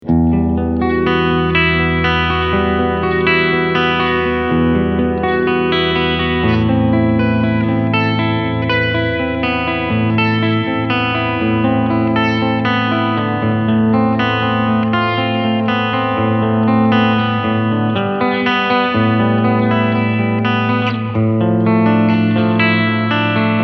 Blackstar HT 20R MkII American Clean

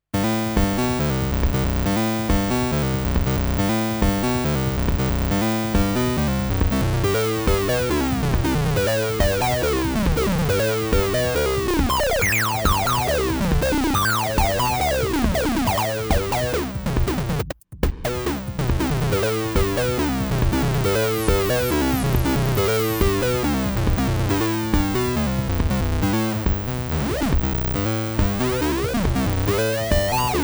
ms20m Sync

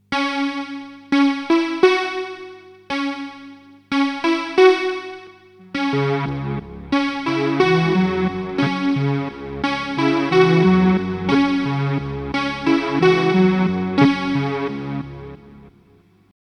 Count to 5 Synth Sound; Montreal Assembly

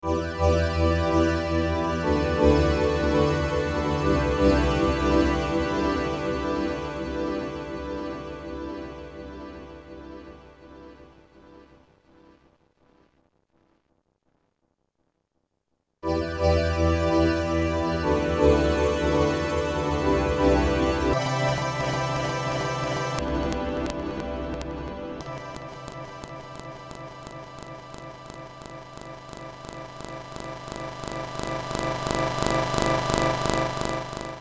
Delay

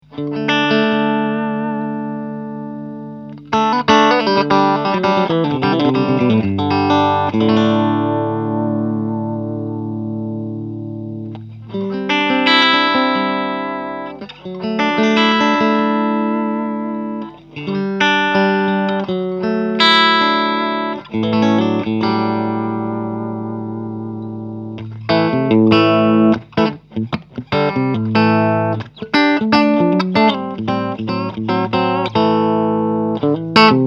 clean strat

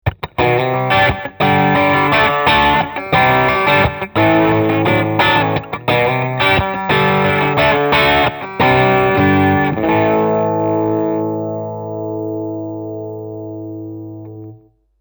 Light Crunch

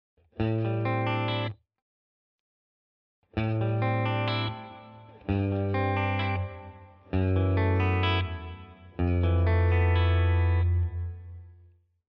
Walrus Fundamental REVERB Plate

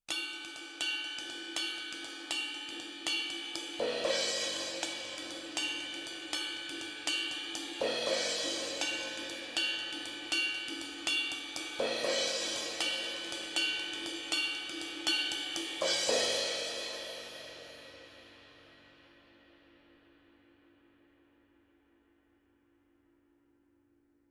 OH That´s 70s Sound; UnderToneAudio MPDI-4

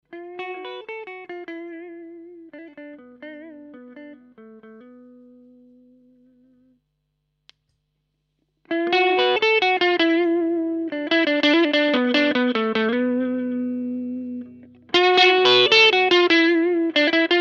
EQD Plumes Mode 3

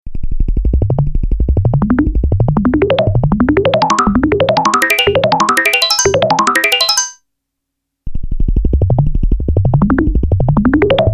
Snappiness; REV2

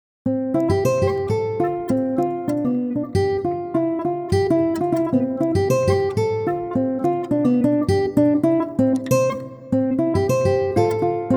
African Guitar

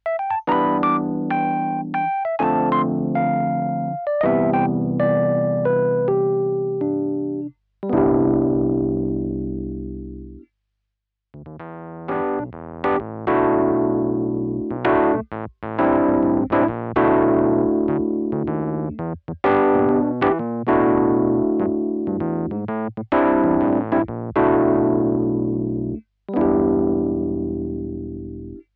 Overdrive

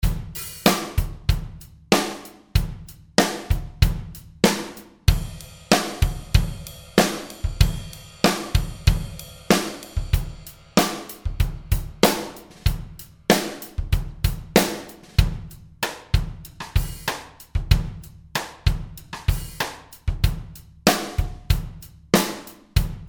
Nashville Sticks

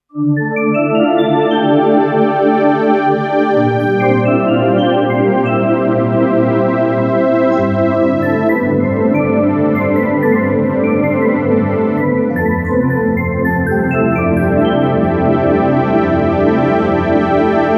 synth; windows ME real edition

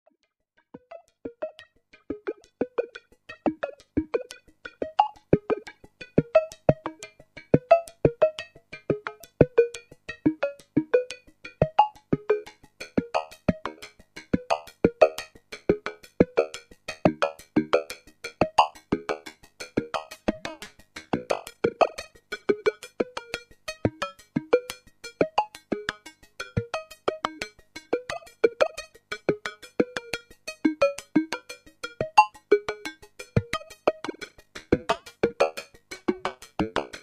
lfo new

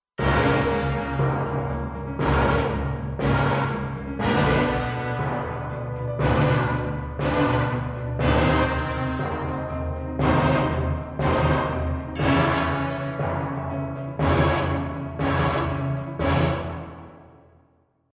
Orchestra; TO Animated Orchestra; Animated Apocalyptic Inferno

